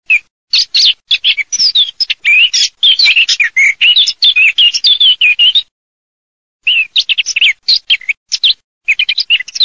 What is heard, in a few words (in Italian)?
CAPINERA